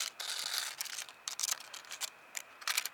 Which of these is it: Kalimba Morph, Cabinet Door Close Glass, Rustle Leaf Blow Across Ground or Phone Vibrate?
Rustle Leaf Blow Across Ground